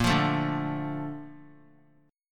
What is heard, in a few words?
Bb Major 7th, chord; BbM7 strummed; JGuitar